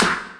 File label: BIG CLAP; Pro-Rec - Synthsonic; TRINITY